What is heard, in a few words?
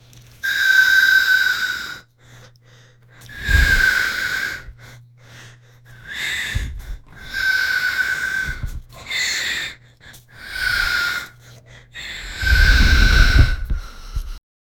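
heavy breathing whimpering, agonizing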